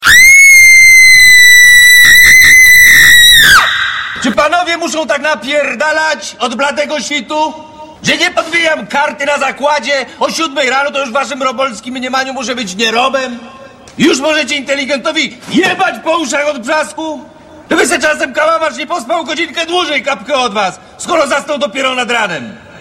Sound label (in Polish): Krzyk